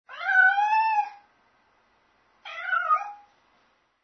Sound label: ANIMAL CAT